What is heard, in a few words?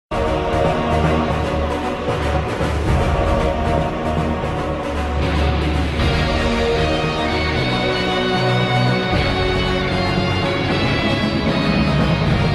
retro; batman beyond